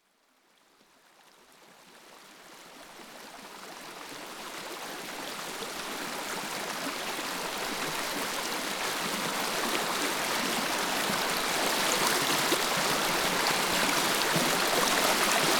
Rain

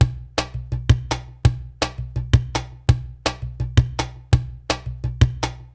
Perc, Percussion Latin

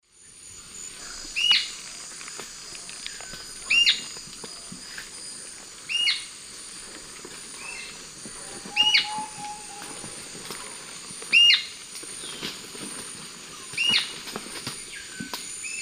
Onychorhynchus coronatus, calls 4 birds; S. America; Eastern Lowlands

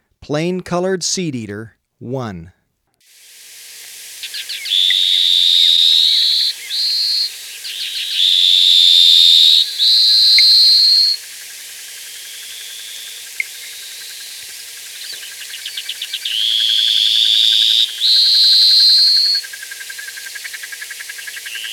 Plain-Colored Seedeater; S. America

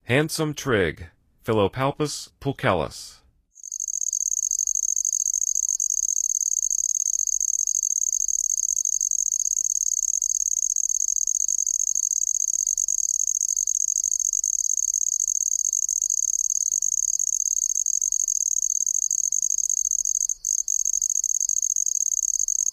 Handsome Trig, Songs of Insects; N. America

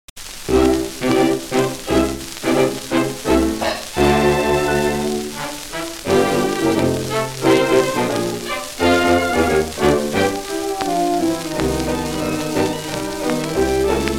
Orchestra; Victor

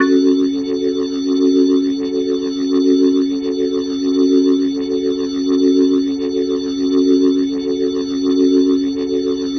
Glowing Organ F# Min; Minor; The Vitale Organ

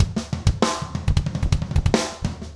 rock toms 90

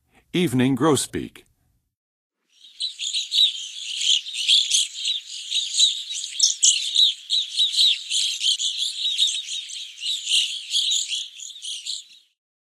Evening Grosbeak, Songs of Birds of North America; Western USA; Western USA Songbirds

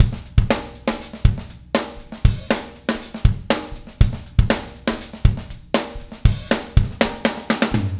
DISCRETE DRUMS

